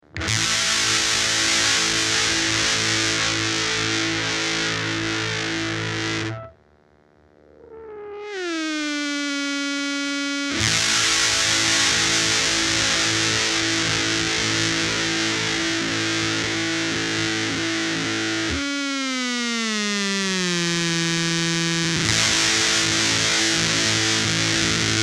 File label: GUITAR CHORD, disasterfuzz; devi ever usa